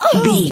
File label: voice female hurt pain mild